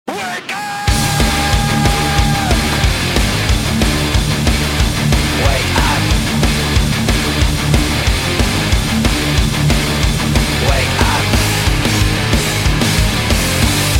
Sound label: Wake Up